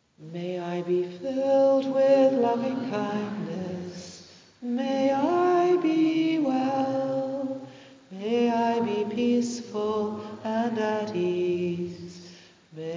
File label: Metta Chant English